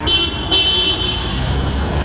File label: distant vehicle horn short tap; vehicles